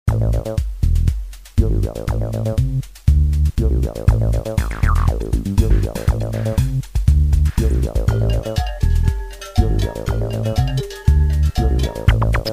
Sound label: low techno; Hyperspace Delivery Boy